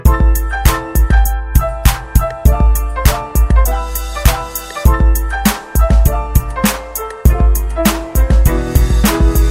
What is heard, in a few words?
Jazzy Bed Jam